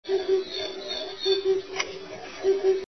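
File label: oriental cuckoo voice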